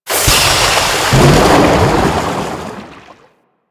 Ocean Metal Tube Water Fill; Ambience; Warframe; Grineer Ocean

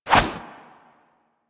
Inf Punch Whoosh, Infested; Warframe; Weapons; Inf Kick Punch

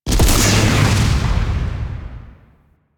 Archwing Scythe shot; Melee; Warframe; Weapons; Exalted Archwing Scythe

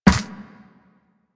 Run Ice, Footsteps; Warframe; Operator

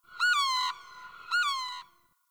Night Creature Medium; Ambience; Night One Offs

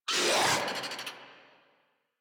Infested Charger Attack, Enemies; Warframe

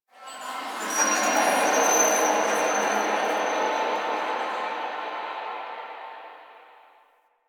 Memory Echoes; Warframe; Quests; TheNewWar; Ambience; Zariman

